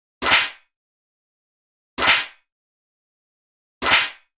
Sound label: metal tray; افتادن; افتادن سینی فلزی